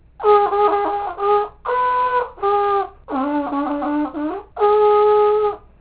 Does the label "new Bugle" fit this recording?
Yes